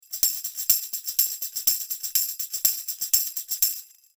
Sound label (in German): Schoeps CMC64 Tambourin close